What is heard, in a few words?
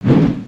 WPN WHoosh; creatures organic